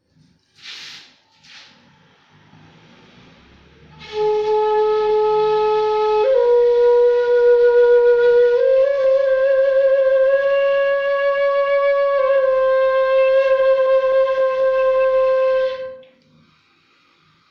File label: key a4 major pvc